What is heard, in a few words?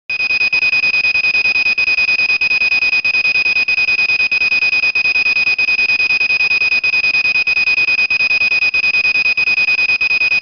Beepcode Undervoltage